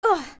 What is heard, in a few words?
young ledge fall; Link falling and grabbing a ledge